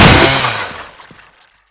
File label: chainsaw hit, weapons